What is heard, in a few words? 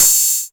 nice open hat sample A# key